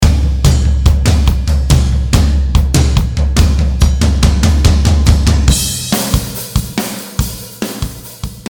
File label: drm sample sonor, drums